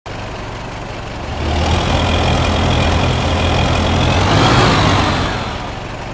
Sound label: Idle Gas; Tiger